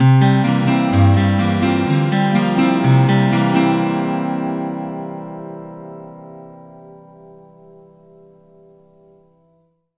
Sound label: pick guitar